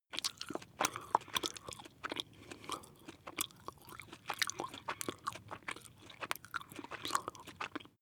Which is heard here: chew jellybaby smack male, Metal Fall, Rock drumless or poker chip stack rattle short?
chew jellybaby smack male